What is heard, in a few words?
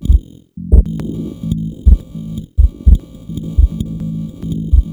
105bpm; elektron machinedrum